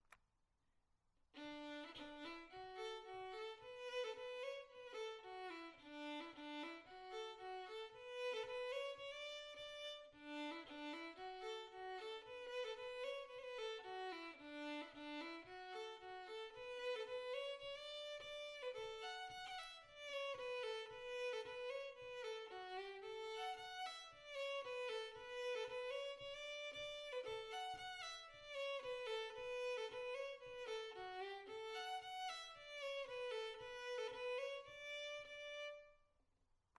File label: Polka, fiddle; Mealagh Valley Polka